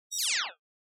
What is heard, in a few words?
TV Turn Off Dry